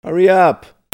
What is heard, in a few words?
PEOPLE